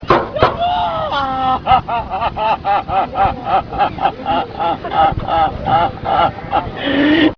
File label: wail